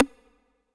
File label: Percussion; Vengeance Samples